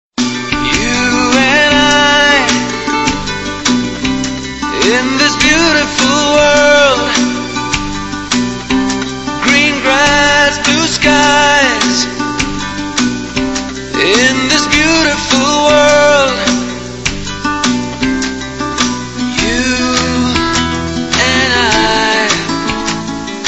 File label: melody, hip hop ringtone